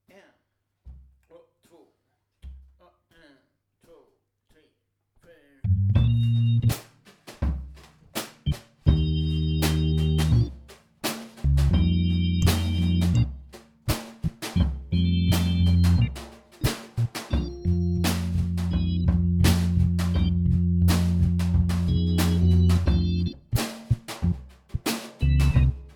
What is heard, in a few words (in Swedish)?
Popvispar funky